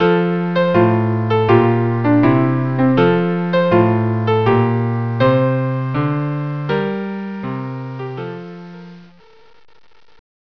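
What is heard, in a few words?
air violin cello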